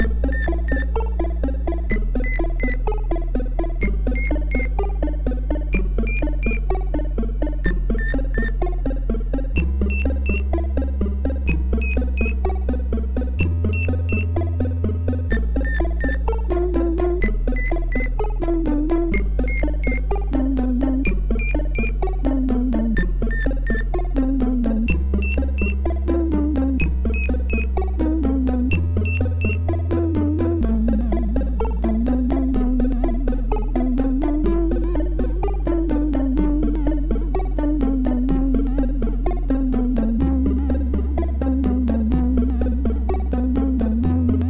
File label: sid MOS6581R4